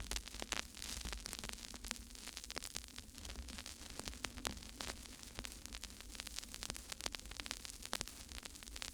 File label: Vinyl Crackles